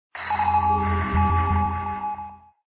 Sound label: scan; cave